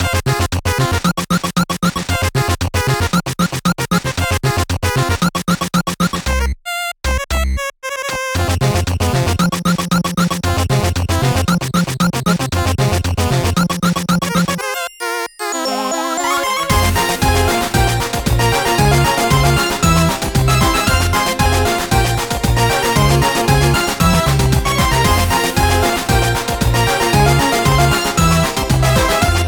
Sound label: spider fight; TS Underswap